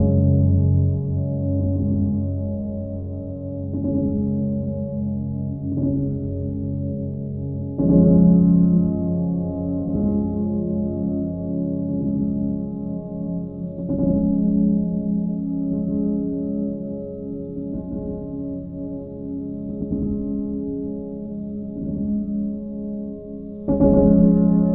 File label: Piano House; minecraft story mode gamerip 2016; Order of the Stone